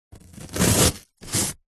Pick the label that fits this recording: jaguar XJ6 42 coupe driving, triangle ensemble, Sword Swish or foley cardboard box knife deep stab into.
foley cardboard box knife deep stab into